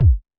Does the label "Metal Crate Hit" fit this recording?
No